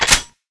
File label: laser sg clip out, weapons